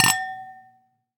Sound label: Glass clink; 7rystan's 600+ FREE EDM SAMPLES & DRUMS; SFX; Foley